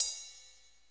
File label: Rid, Crashes & Cymbals